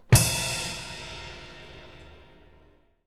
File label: crash cymbol and bass drum, percussion